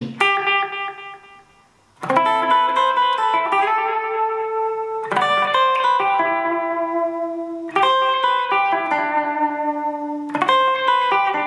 Digital Delay Boss

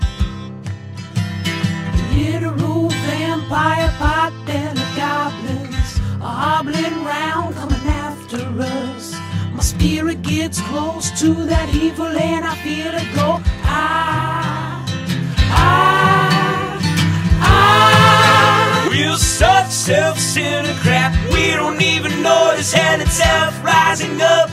Folk

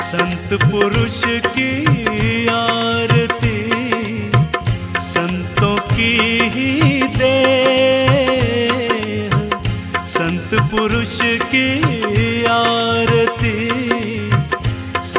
aarti

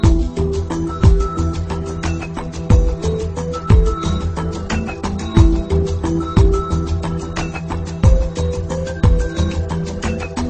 Mystical

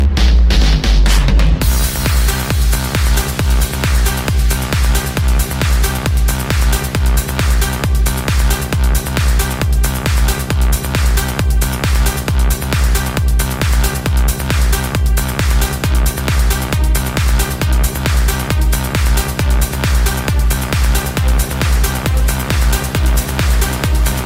Drum

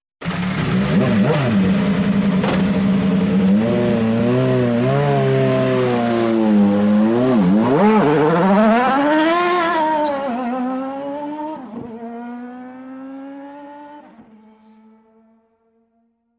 Motorbike

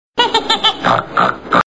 Snort; Steve Urkel

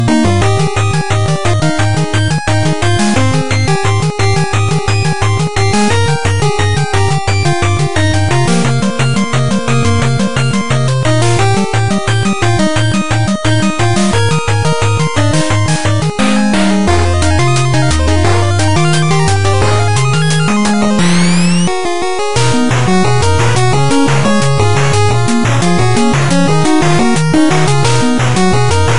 8bit; Madoka Magica

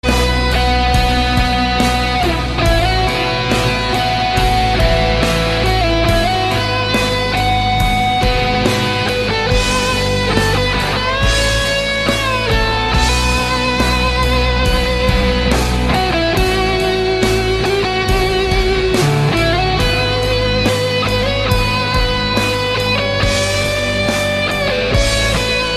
Rock

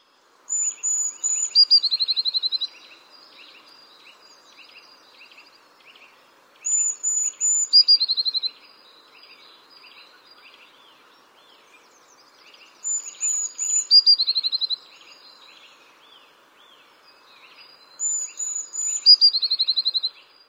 Bird Sound

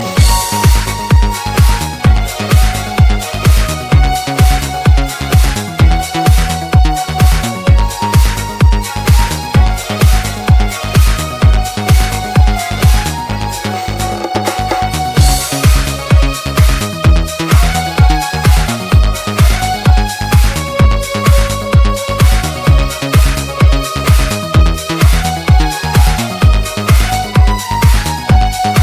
Instrument

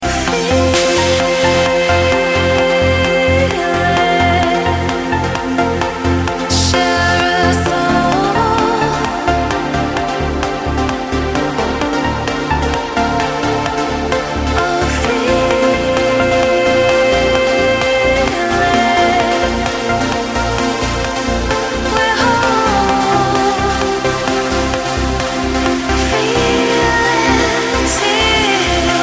Vocal Android

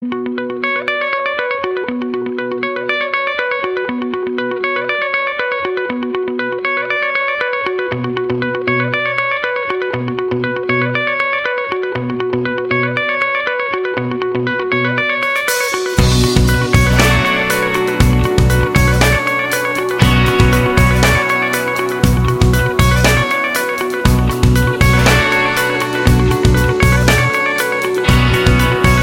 Rock

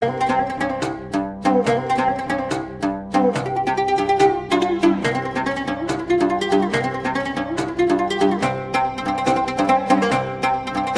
Strings Play

